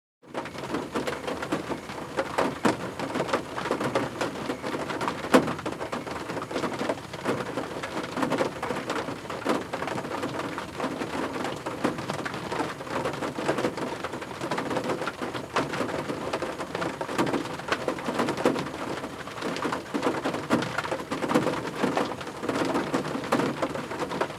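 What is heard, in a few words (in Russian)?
rain drums, beats on roof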